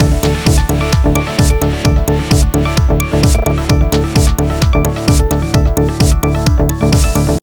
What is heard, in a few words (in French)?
Vent B; Sony; Xperia Movie Creator Music